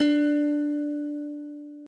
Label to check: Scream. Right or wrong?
Wrong